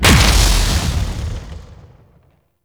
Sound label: Sentient Vessel Step, Enemies; Warframe; Tau; Movement